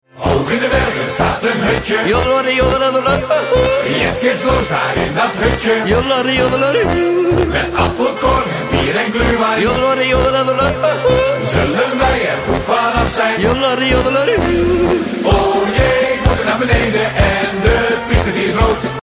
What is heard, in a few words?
jodel jump